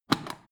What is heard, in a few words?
foot switch turn on off quick